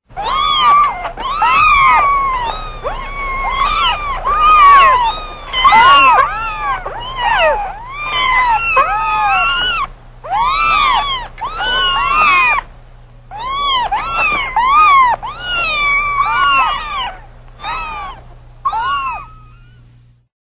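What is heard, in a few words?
kittens meowing sounds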